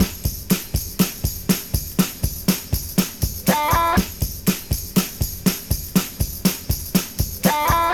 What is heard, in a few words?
121 bpm drum loop b key